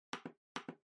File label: Trackpad Click Far L Double